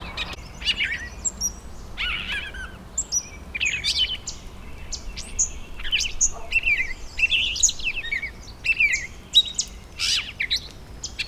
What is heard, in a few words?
gray catbird